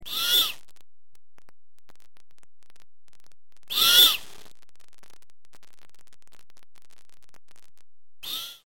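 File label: Great Horned begging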